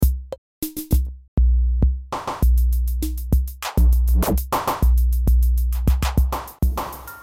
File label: korg er 1, Korg Electribe ER-1 mkII Drumkit